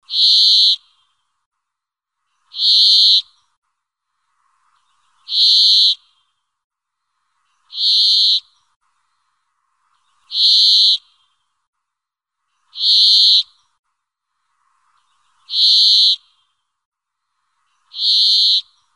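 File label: Yurok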